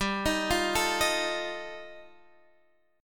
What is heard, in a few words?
G 7th Suspended 2nd Sharp 5th G chord, Guitar Standard; arpeggiated; JGuitar